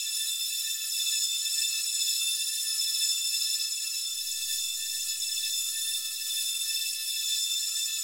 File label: amb abstract whispy lp, ambient; Half Life Model Pack